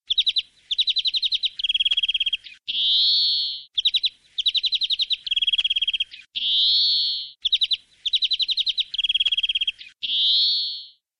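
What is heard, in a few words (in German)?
Grünfink; vogelstimmen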